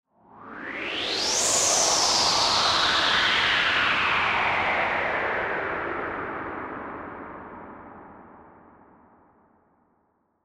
Sci Fi Wind Gust Synthesized, Shooting Star Fly By Burst; Blastwave